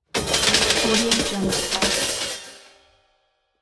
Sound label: cartoon blooper; moonaudioproduction